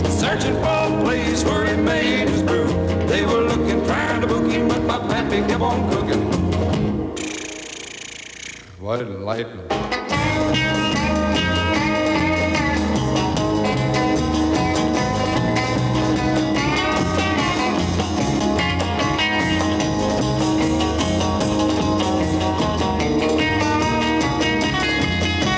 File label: country